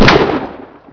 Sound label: glock, weapons